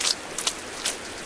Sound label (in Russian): шаги в дождь